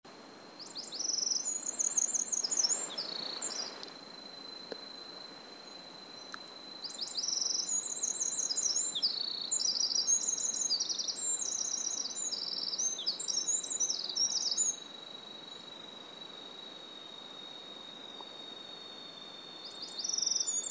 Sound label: Troglodytes troglodytes; SETE